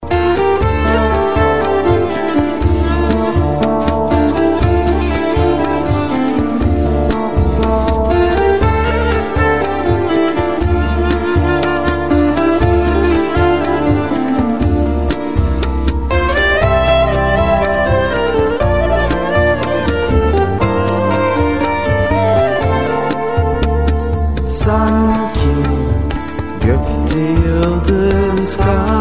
Romantic

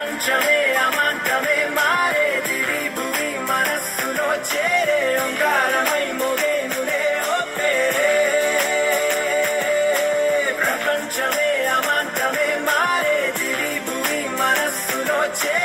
vocal

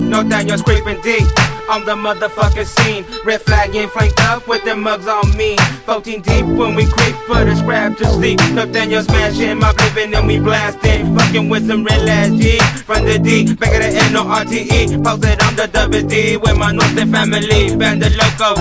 Screaming Out

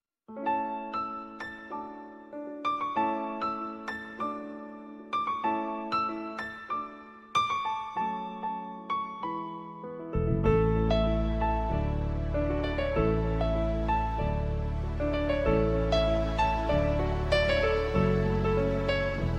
Soft Music

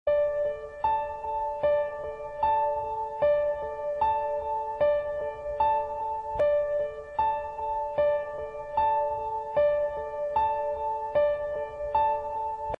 Heart Beat Piano

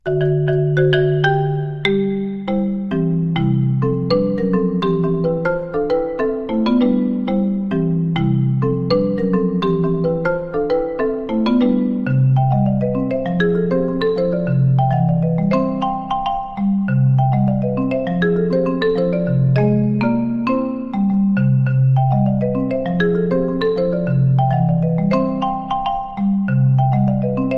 Xylophone; Mario

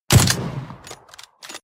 Suppressed M24

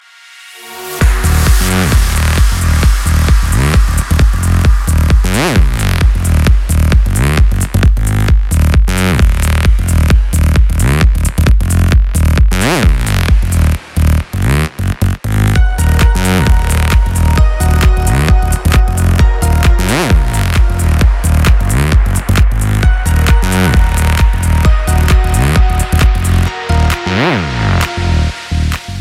Electro Ringtone

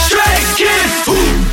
Ooh

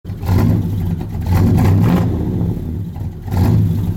Service truck pipes

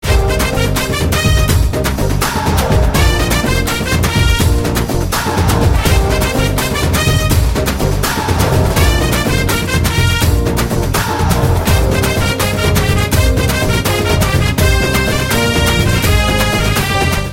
Beat

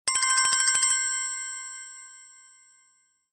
Chime; S4

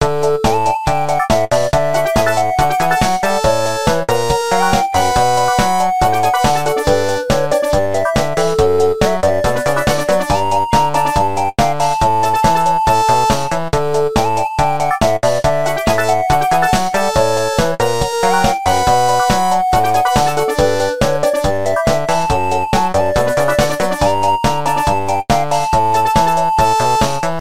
LG tune

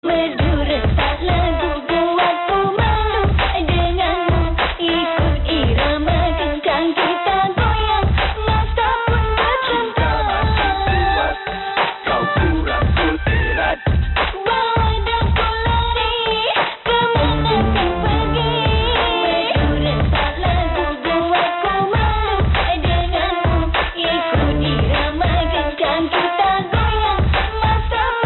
Malay Song Remixs

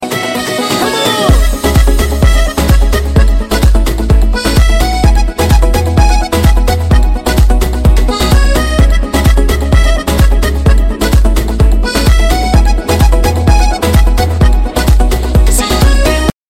Dance Tone

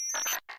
Ghost Chirp

